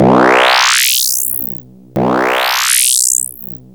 GROWL; Zero-G Ambient 1; EXTREMESTUFF

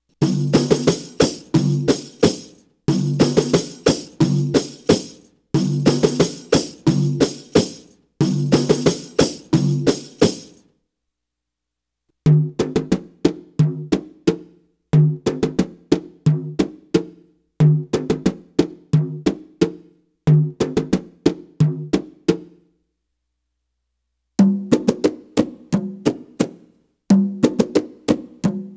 Arabic Rhythms

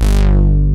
analog stab; Pro-Rec - Synthsonic; TRINITY

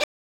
Whisper and Mantra Echo; SNES; Secret of Mana

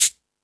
Timbale, E-MU Pro-Cussion

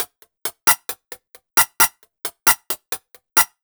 133 Ragga & Dub Reggae HH; USB Soundscan vol.27; AKAI

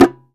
GENDANG G4F, GENDANG DRUM; Spectrasonics; Heart of Asia

